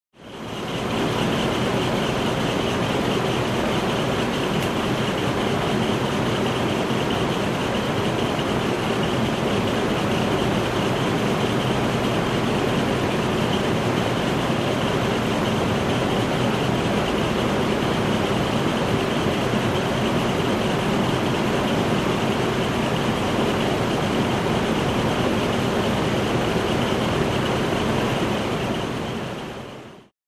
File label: Industrial Machine Turbine